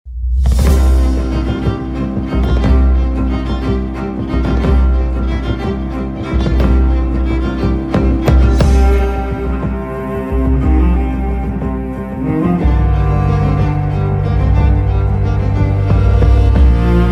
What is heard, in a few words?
music